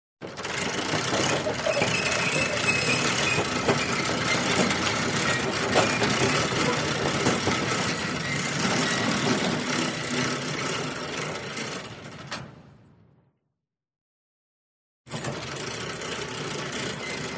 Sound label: Corrugated metal door; SOUND EFFECTS1